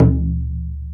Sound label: taiko beater; EMU Planet-Earth